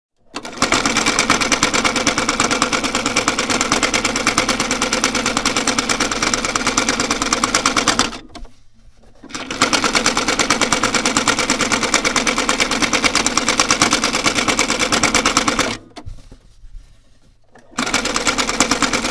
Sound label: Sewing Machine Series Sound Effect; riconosci l'oggetto dal suono